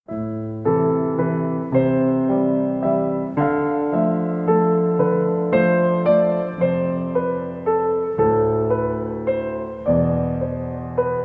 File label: goodending loop; Identity V